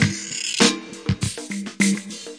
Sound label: woodfish intro, drum loops and breaks, 100 bpm